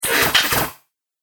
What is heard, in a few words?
Broken Droid Bits, Robot; Robot Sound Effects